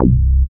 SYN TB303, Roland; Sound & Vision - Gigapack I CD 2

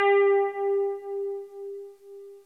E piano G4; Greytsounds VOL-1

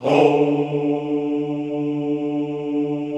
HOUUU, Classical Choir

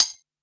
Quasimidi 309, Drums

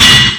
SY35, Yamaha Sy35, Drums

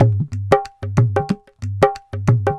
92 Dholak Ph, Loops & Phrases; Roland BK-5

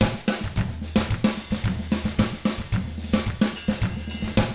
110 SMBA samba; ILIO; Double Platinum Drums 1

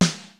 KICK SNARE; Kid Nepro; Elite; KICKS SNARES

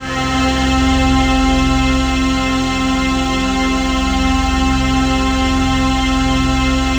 PAD, Dance Mega Synth; Best Service

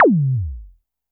korg trident

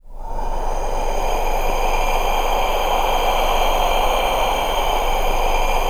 O NOISE BED, VOCAL WIND; ILIO; Vocal Planet; Jazz & FX

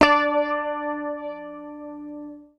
PICK, Bass Library; Sampleheads; Will Lee Bass Library

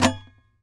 SAZ TAP; Spectrasonics; Hans Zimmer Guitars VOL-2

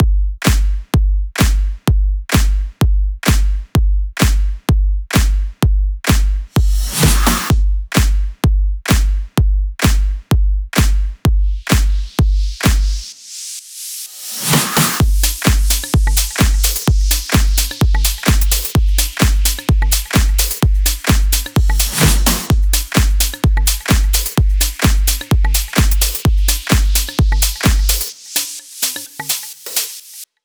128 BPM Full Drums; Vengeance - Essential Clubsounds Vol.5; Old College Kit Root G; Drums & Perc

